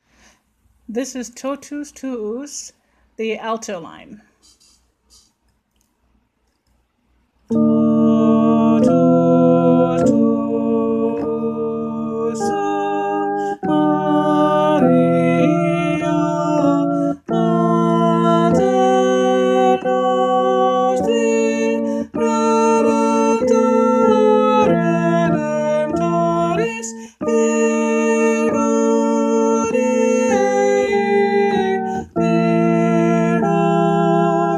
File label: Harmony Alto or Tenor; Totus Tuus; Ordinary Time